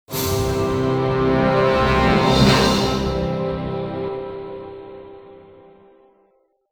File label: Success; Sniper Elite Berlin 1945